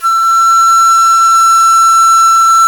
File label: QU DI E6, CHINESE SET; Spectrasonics; Heart of Asia